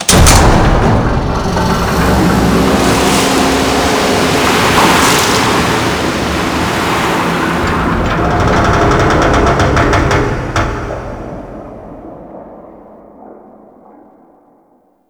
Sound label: Ocean Clunk Alarm; Ambience; Warframe; Grineer Ocean